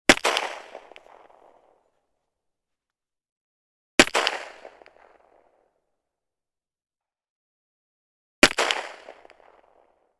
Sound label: weapon gun walther ppq; still north media